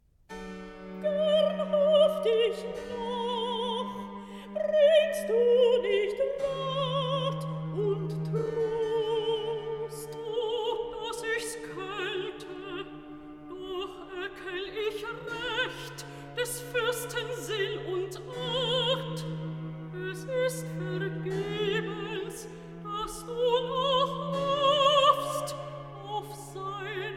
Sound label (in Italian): Recitativo; Act III; Nitocris, Daniel; Gern hofft' ich noch; Handel Masterworks; Klassika